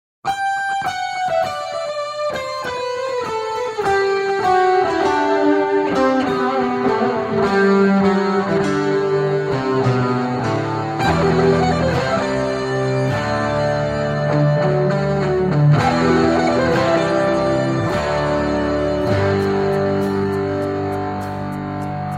electric guitar cover

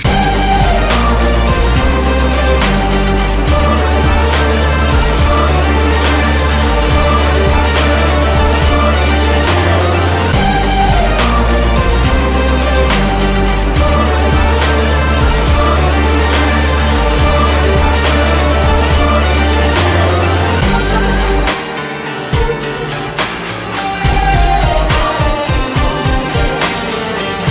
carol bells dubstep

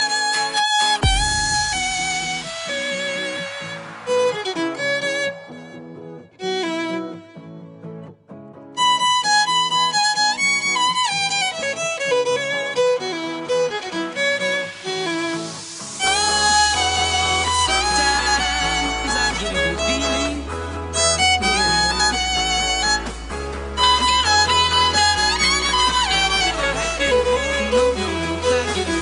violin cover

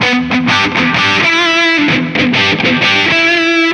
custom rock guitar

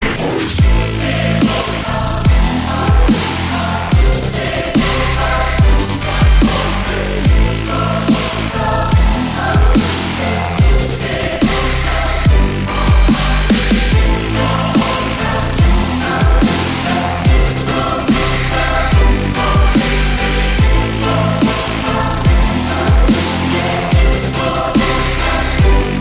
dubstep instrumental

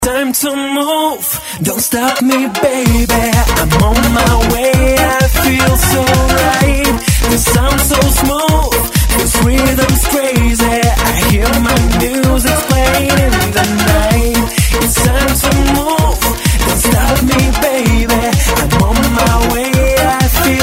house

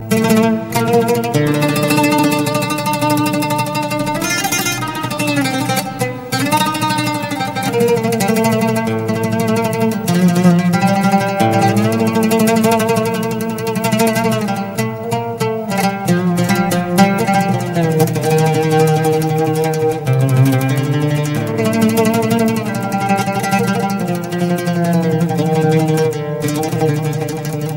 beautiful arabic music

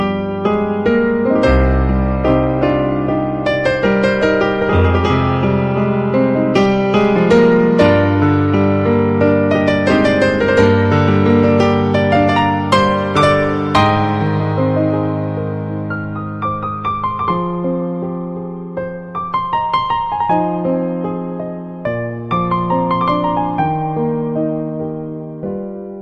romantic

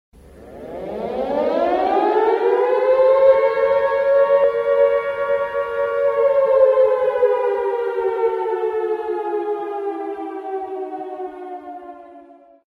siren short

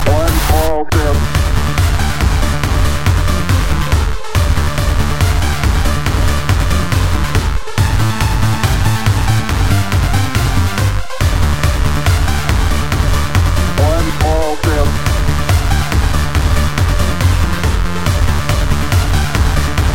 ringtone

